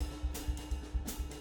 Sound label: 170 Tradition Jazz Drums